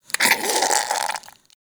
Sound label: Goop Squeeze Close; Ambience